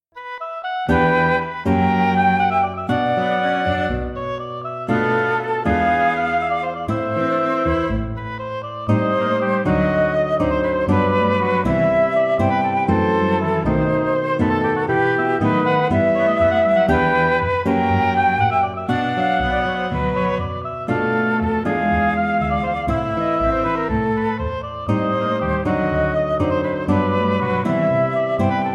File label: calambre quintet